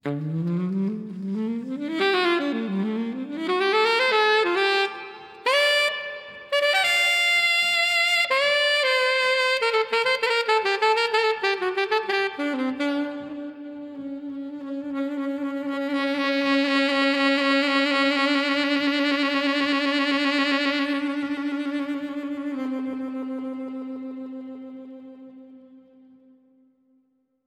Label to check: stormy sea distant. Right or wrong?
Wrong